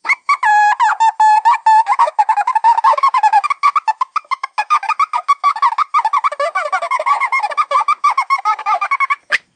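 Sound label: donkey crying sound, animal vocalization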